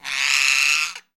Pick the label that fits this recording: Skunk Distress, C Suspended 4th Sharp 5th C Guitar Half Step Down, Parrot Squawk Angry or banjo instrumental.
Parrot Squawk Angry